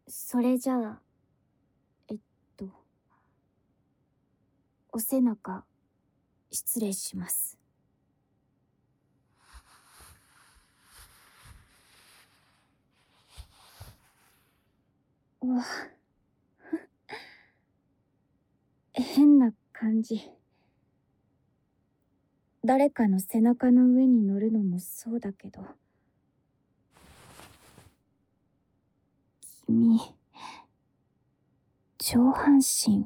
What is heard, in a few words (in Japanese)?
クリームボディマッサージ, ASMR